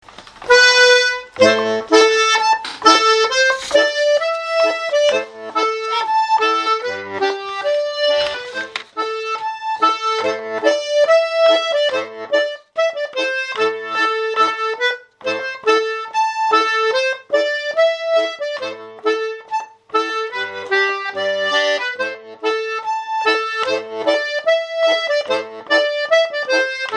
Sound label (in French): polka lente; O Keefe